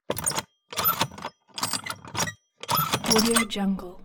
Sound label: tobacco cutting machine antique crank